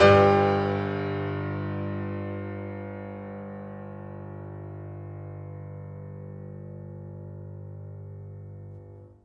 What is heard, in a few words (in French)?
orgues; Notes